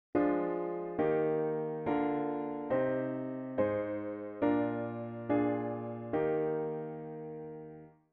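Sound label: diatonic inverted V7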